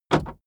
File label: car remote lock unlock interior